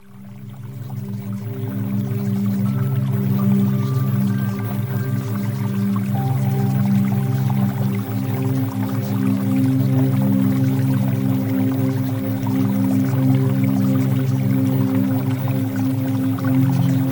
Music Theta Waves; Premium Subliminals

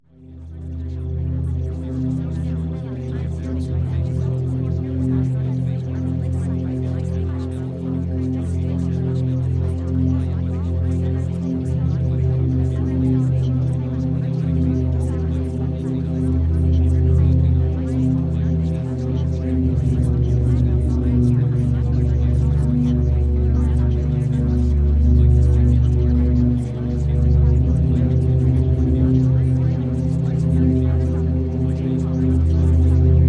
Music Sample; Premium Subliminals; Emotional Mastery

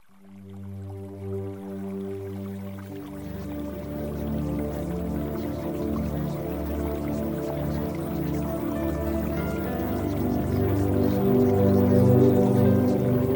Music Sample; Premium Subliminals

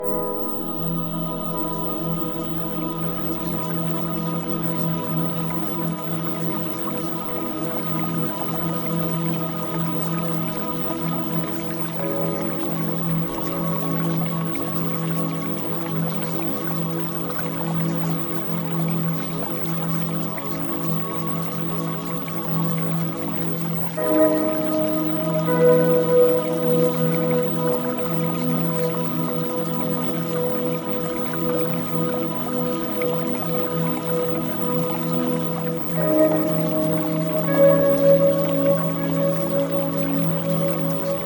Music; Premium Subliminals

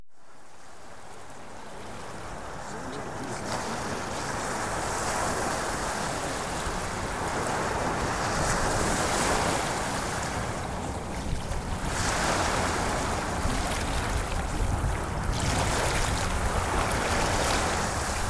Ocean Waves; Premium Subliminals

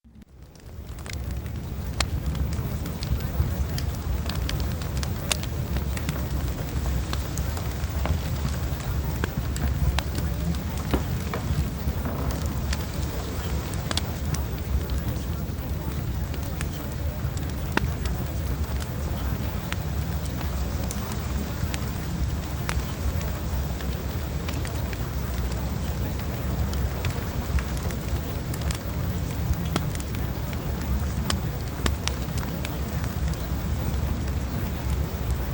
Fireplace; TESTOSTERONE BOOSTER; Premium Subliminals

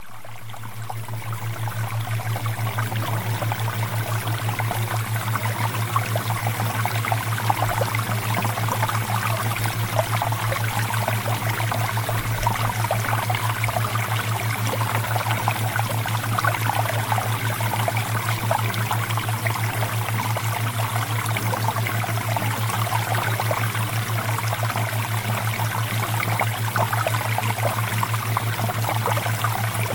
Water Theta Waves

